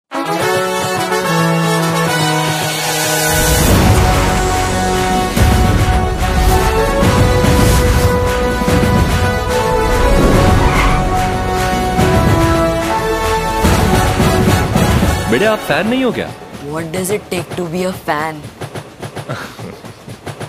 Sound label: Anthem; ICC Cricket World Cup 2023